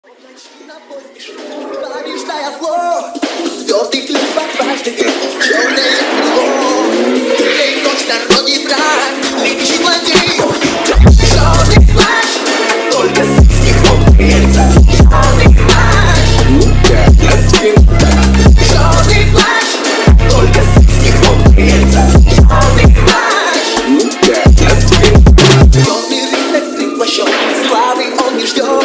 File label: phonk remix